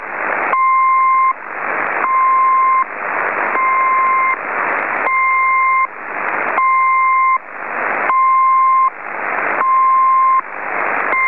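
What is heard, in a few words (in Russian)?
шум с длинным пиканием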